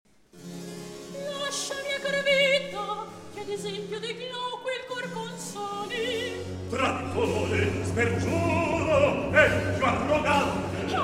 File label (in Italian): Recitativo; Lascia Mia Cara Vita; Polifemo